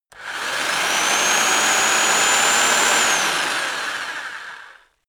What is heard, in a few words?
rock drill rev reverse very long